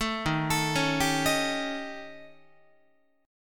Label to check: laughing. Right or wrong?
Wrong